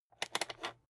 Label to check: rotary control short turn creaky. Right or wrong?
Right